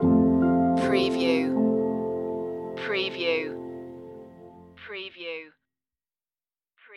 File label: slow summer stinger